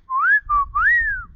siffle

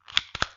charger

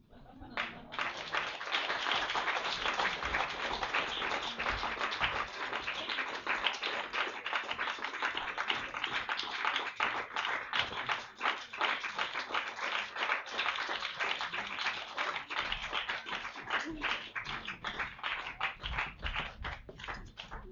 applaudissements

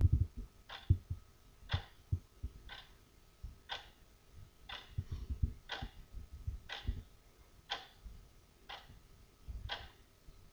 tictac

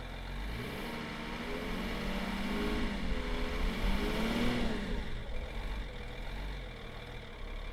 acceleration

